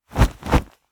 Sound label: whoosh cloth leather fight